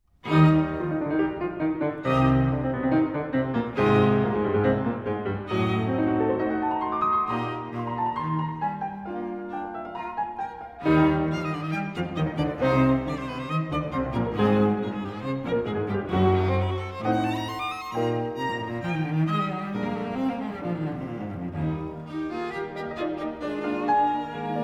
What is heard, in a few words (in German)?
Klavier Trio